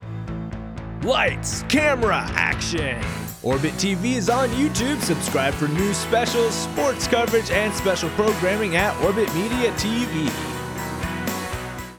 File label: Sweep; Youtube